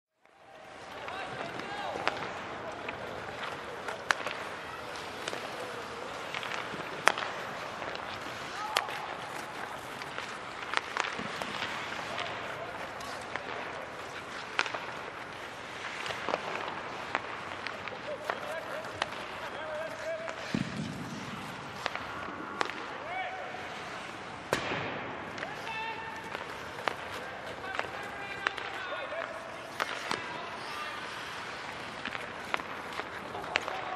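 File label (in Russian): общие звуки игры в хоккей катание броски голоса щелчки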